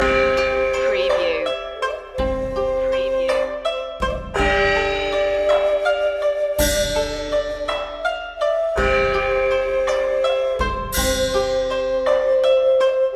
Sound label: chinese waltz loop